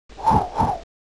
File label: Button, 按钮; 特酷声音素材库